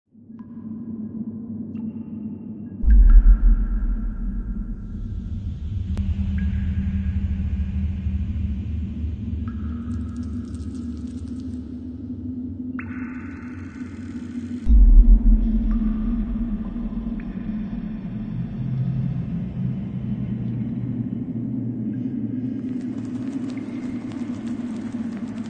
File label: ambience; anubis; de anubis